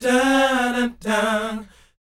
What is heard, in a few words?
BOYZ, Vocal, R&B Dance